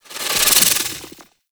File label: Slinky Movement Rattle; Warframe; Man In The Wall